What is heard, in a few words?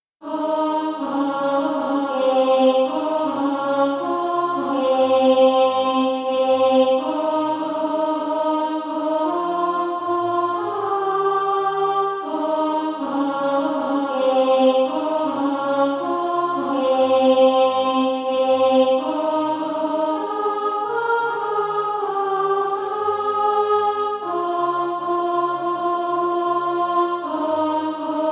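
alto, SATB Practise Music; Each Step of the Way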